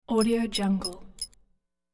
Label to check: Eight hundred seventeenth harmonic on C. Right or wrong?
Wrong